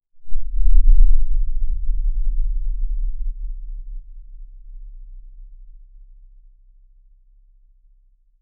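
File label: a powerful shield activation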